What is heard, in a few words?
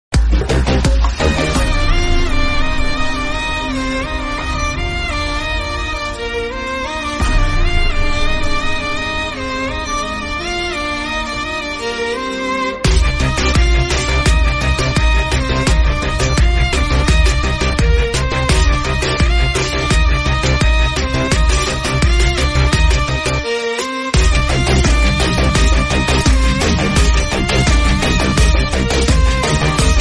movie bgm ringtone remix; vikram movie